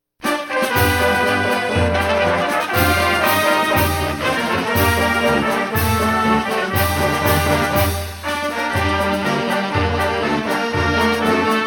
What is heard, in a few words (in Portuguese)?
Hino de Irati instrumental